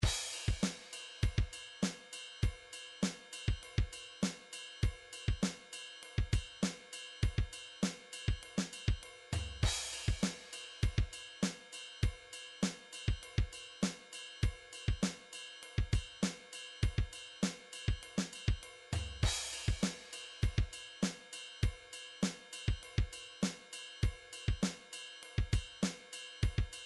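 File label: midi drums hard rock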